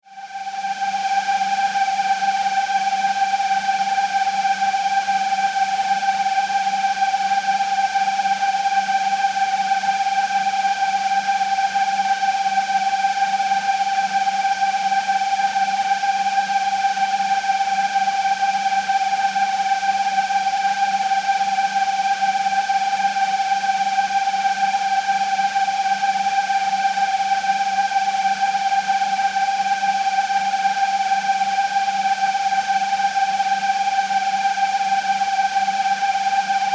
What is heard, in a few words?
Science Fiction Static; Communications